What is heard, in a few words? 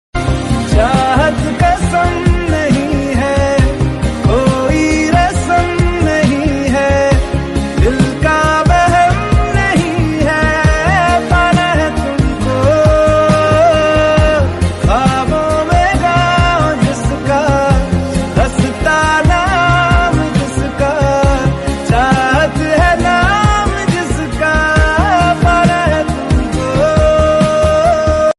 ringtone